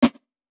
snare; bespoke - Floral v.2

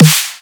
snare Rumble; drums; base pack

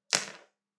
useless foley; drums; percs, foley; base pack

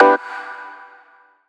Guitar Hit; SFX; 7rystan's 700+ FREE COLOSSAL EDM SAMPLE PACK

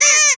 "Yay!"; SFX; 7rystan's 600+ FREE EDM SAMPLES & DRUMS

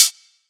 Hat; 7rystan's 700+ FREE COLOSSAL EDM SAMPLE PACK; Percussion; HiHats

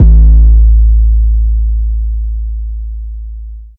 Trap bass, EDM

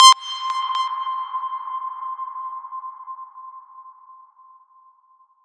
Impact

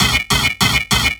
Crunch; 7rystan's 600+ FREE EDM SAMPLES & DRUMS; SFX

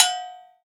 Cowbell; 7rystan's 600+ FREE EDM SAMPLES & DRUMS; Percussion

